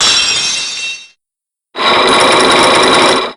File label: lock end